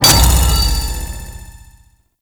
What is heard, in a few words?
Pre Death Start; Warframe; Duviri; Dax